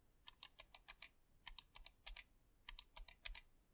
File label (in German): F und DPI Tasten